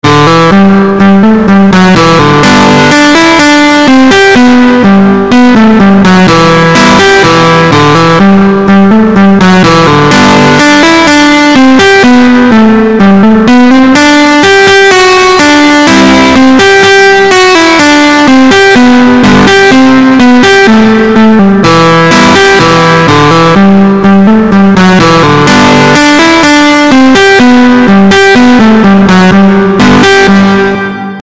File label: boys of wexford, banjo